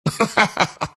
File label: laugh; dota; disruptor